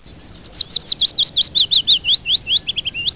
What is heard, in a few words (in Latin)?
Phylloscopus trochilus